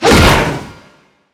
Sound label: Skill Swordman Power Gain